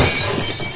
Plate Breaking

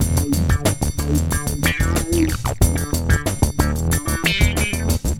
Pre Reversed 70 Funk

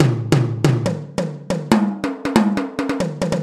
Fresh Tom Rolls

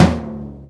Ludwig Mid Tom

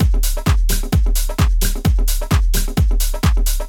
Hard House Loop

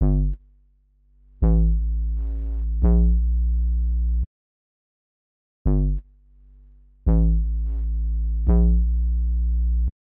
Jump Up Jungle

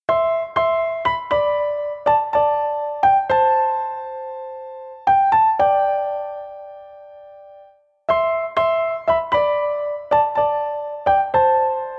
Piano Melody

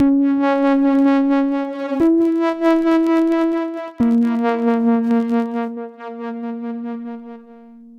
Horror loop 120bpm

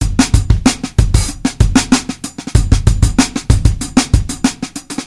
Poppy Hard Drum Loop

